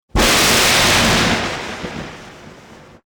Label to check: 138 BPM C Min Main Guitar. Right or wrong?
Wrong